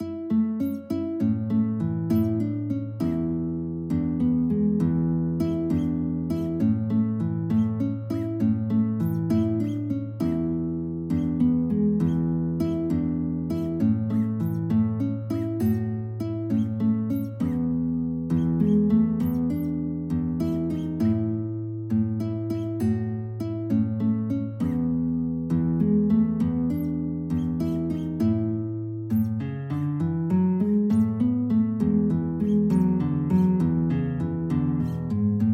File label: chamarita guitar